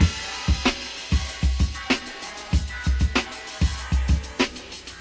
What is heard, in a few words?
096, DRUM LOOPS and BREAKS